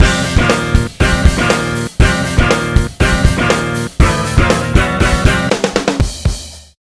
win

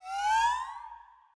barrier up, puzzle; CrossCode

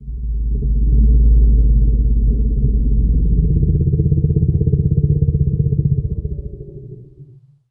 dungeon supernatural long; Skyrim Special Edition

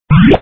powerup; demolition 2

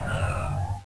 skid; madcars2; radicalplay

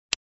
click

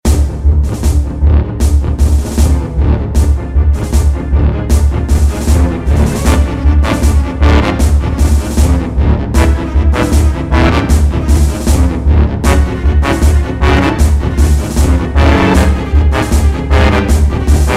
boss map; alice-project

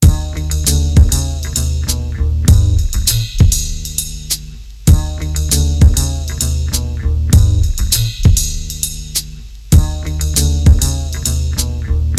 Dog At The Door (Instrumental)

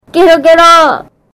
damage

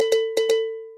chain; tenaPuyo

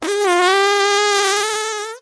fart

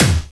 gun; madcars2; radicalplay

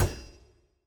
ball bounce; battle; CrossCode

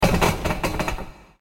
nudge

